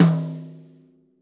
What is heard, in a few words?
rack tom - snares on; JazzFunkKit